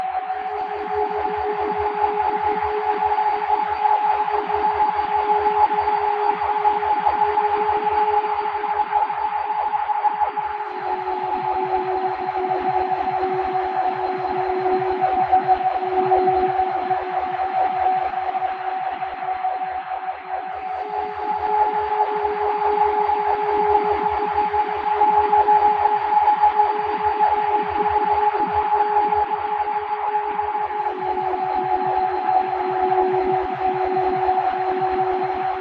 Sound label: synthy ambient; gamerip; lucah born of a dream